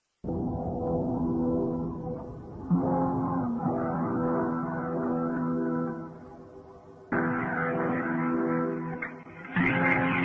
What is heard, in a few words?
dubstep